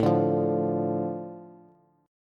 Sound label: A Suspended 4th Sharp 5th, Bass; Asus4#5 strummed; JGuitar